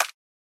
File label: decision, button